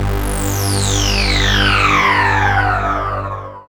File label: SYN, dance; Sound & Vision - Gigapack II